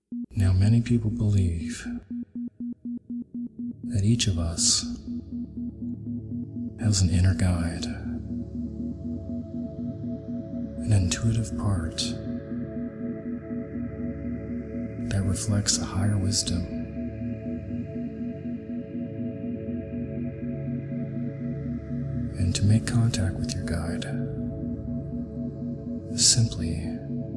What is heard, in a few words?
Inner Guide Third Eye With Isochronic Tones